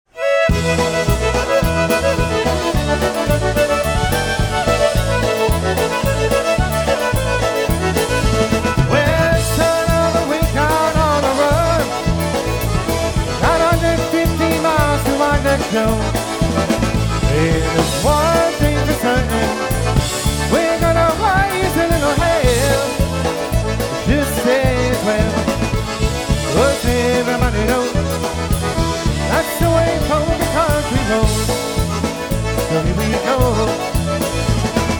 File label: Polka, Live Jam